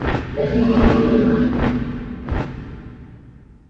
dragon animation flying in the background of the reels on guaranteed free spins; koi princess